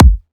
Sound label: Riddim Kick